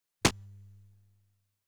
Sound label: Sound of Player's Feet; Dragon Breed